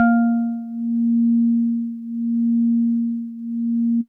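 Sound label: House Organ DX100 A#0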